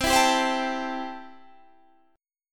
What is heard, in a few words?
C 6th C, chord